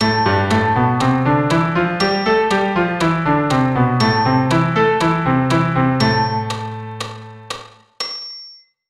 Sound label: Am natural